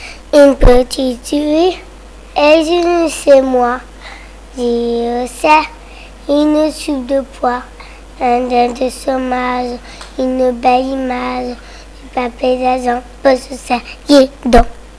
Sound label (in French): chant